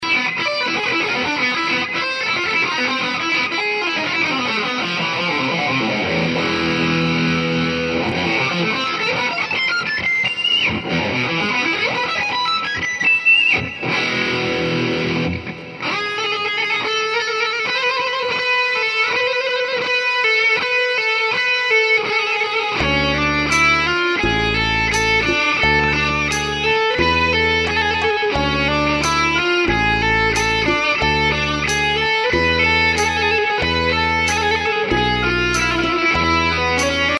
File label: balkan sample, electric gypsy, Balkan & Mid-East tunes; EXOTIC EXTREMES